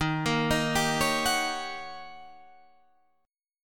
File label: Eb 7th Sharp 9th, Eb, Guitar, Open D